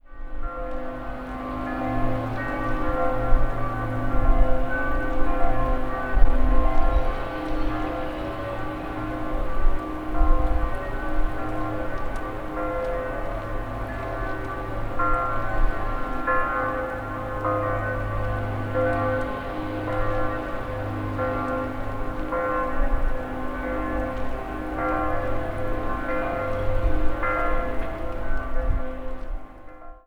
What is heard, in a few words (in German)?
Kirchglocken